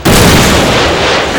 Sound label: missile boost; killstreak rewards; predator